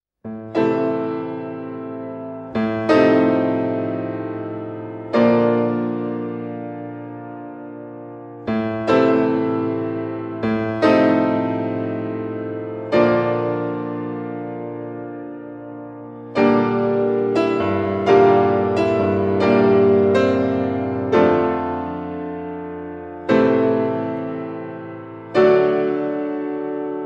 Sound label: Piano Sonata in C-Major; Piano Sonata No. 2; And He Was Crucified